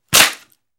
Baseball Bat Wood Cr; baseball fx & games

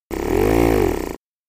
Piston Pump; Motor Revs, Low And Fast With Chugs

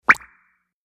Bubble Pop; bubbles

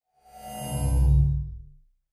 Wash HF Elect Buzz LFE

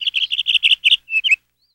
Canary Call, Chirp

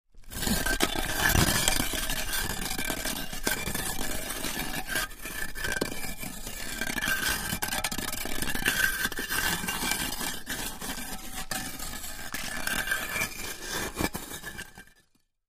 Rock Scrapes, earthquakes

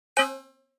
Flash Beep Low Pitch

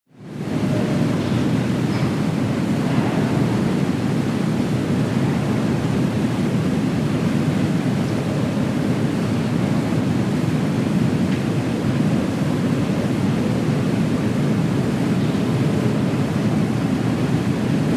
Big City Rumble BG

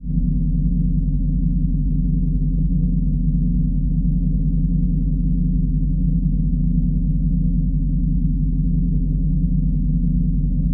WARP ENGINE HUM; drones & tones

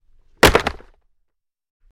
Football Helmet Pla, football

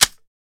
Crossbow Set Click

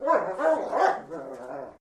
Shepherd Dog Growlin

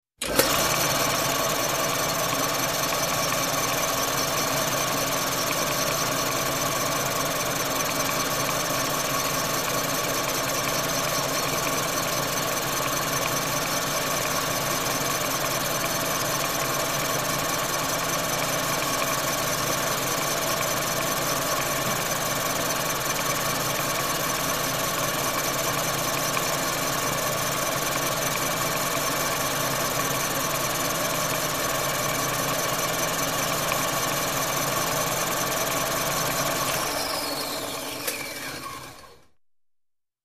Lathe Tool On Std Off

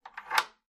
stall door latch; latches & bolts

